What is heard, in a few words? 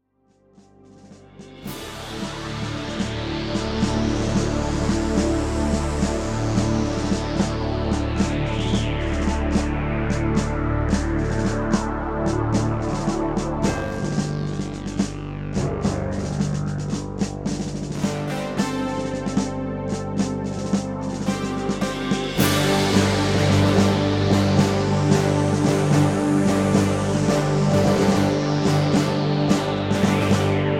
Fanfare